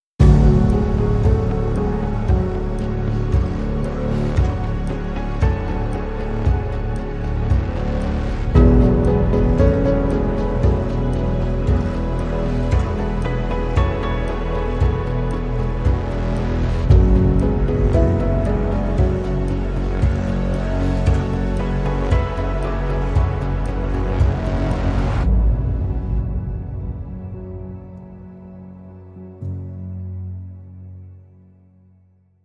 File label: Counting Waves